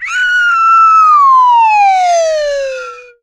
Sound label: whistle with sliding tone, Cartoon Film Effects